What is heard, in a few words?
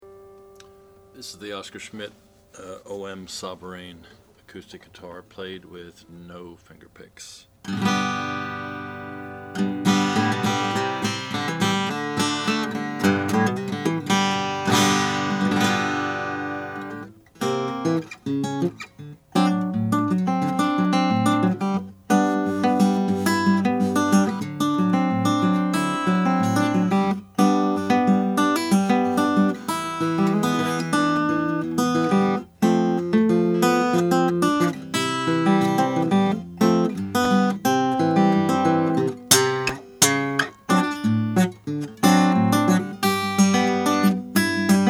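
oscar schmidt sovereign om sunburst, Guitars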